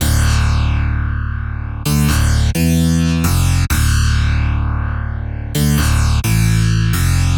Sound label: D Bass Jagged, 130; Zero-G; Future Wave